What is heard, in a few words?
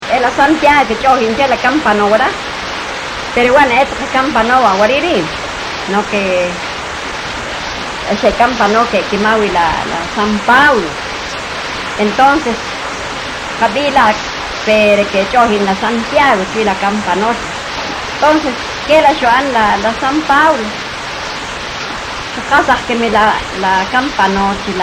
campana